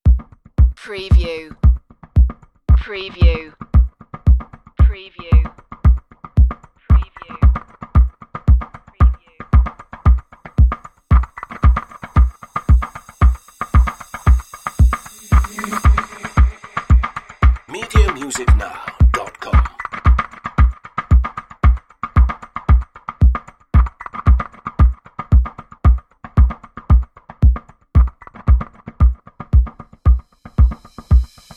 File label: searching for peace loop